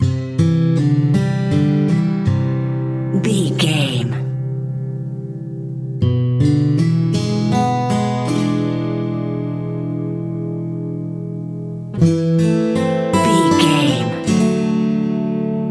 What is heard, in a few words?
cabin woods underscore